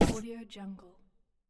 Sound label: scifi machine impact